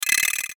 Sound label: Clicks Electronic Multiple Musical Descend; Production Element Imaging Element Accent Transition; Multimedia; Blastwave